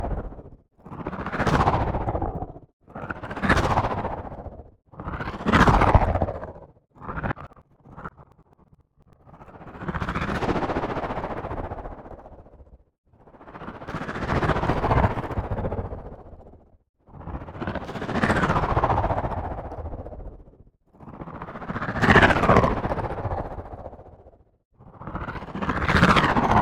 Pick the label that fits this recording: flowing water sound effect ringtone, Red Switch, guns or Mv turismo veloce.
guns